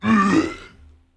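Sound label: orc attack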